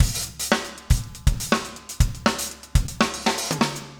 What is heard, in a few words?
funk 120 bpm, loops